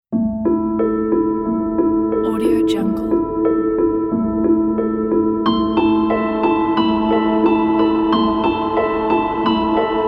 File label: scary melody